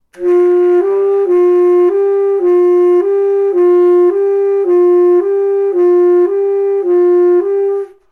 line fast saxophone

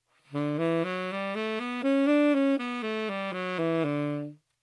D major scale, saxophone

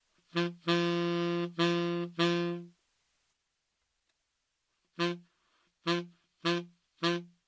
upbeat example

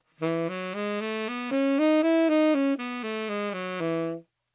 E major scale, saxophone

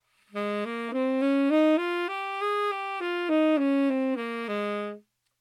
Ab major scale, saxophone

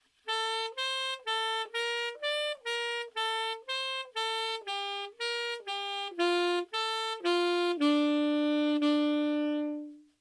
saxophone solo slow

